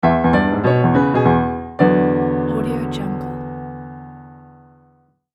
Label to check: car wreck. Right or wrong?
Wrong